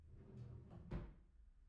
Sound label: drawer; IEEE AASP